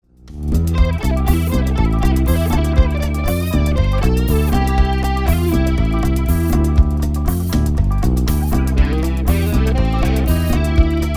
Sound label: lead